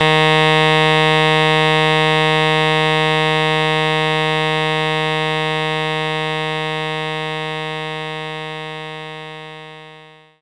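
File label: Alto Sax Eb3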